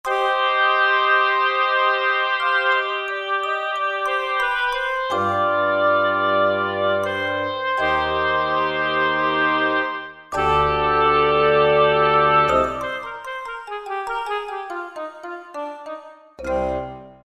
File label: Mallet Stack, Roland SR-JV80-02 Orchestral